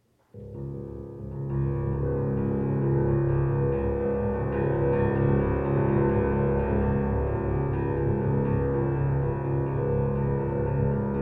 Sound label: Solo Piano, Live; Apparitions; Creative Music Studio, Woodstock, NY